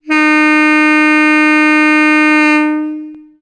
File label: klaxon, Train; OpenBVE; Szemely